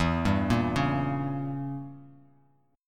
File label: E Diminished 7th E, Bass Standard; Edim7 arpeggiated; JGuitar